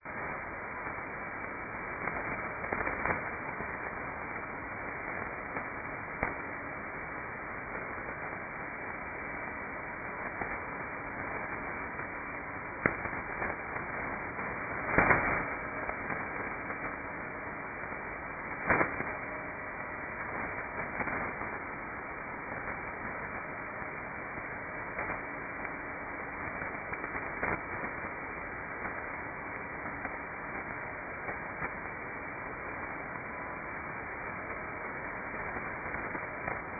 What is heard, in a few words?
time beep